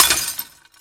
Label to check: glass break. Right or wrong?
Right